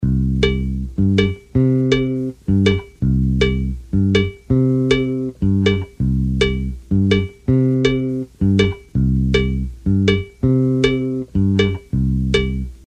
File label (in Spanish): bajo patron tumbao; Interpretación musical para bailarines